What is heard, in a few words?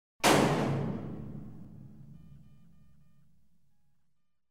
impuls